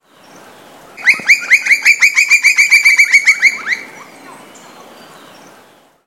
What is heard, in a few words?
Colaptes campestris; Pq Caracol; Canela